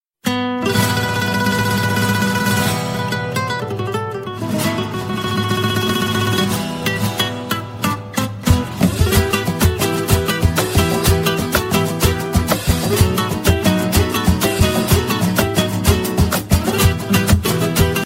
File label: Guitar Tone